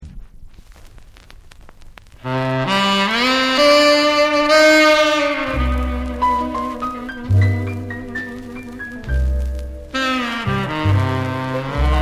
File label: SAX